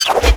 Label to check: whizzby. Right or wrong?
Right